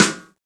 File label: PRC, Rap FM Perc, Roland; Rappin Perc